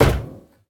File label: metal step; Golden Crayon Sounds